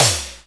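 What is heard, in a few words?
cymbal cymbal FX, Analog Drums; Roland - Rhythm Section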